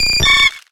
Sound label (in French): Cri